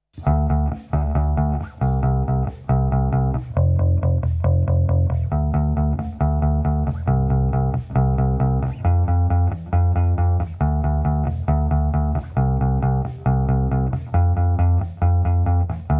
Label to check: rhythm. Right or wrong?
Right